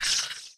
Stun Spider

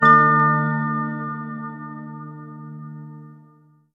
Level Up; Legend of Grimrock 2012

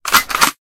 Gun Reload; Smashy Road Arena android ios 2016

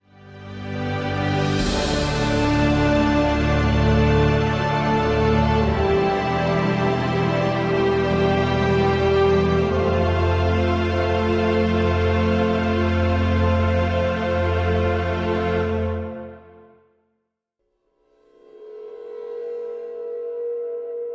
Mission Success; World in Conflict gamerip 2009

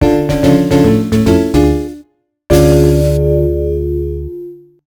Minigame Fail; la prova del cuoco ds gamerip 2009

